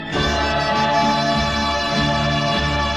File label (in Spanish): melodia trompeta